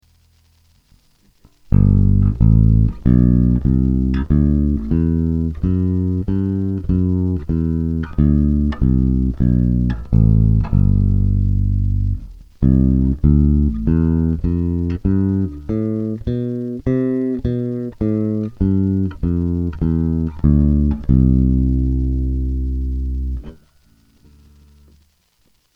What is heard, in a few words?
ibanez gio soundgear p bass